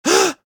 male gasp; CosmicRage; socials

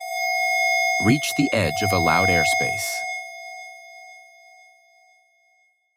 reach edge, aircraft; CosmicRage; combat

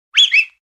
whistle

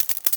wrist

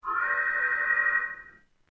fc emergency, flight control

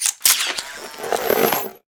build, factory

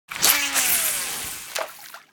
fish cast, fishing

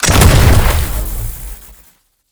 rifle, combat weapons

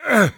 attack; CosmicRage; combat; creatures

